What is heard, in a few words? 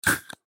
Inhaler Spray, Inhaler Spray Hard Air; Spraying; Foley; Blastwave